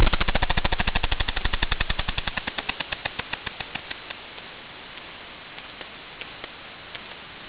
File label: machine gun, whale songs